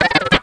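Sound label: droid vocalize; mse6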